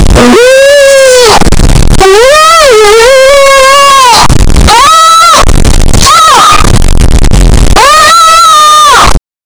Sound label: low growl